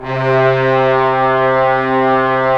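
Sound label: brass trombones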